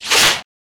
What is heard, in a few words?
sword swinging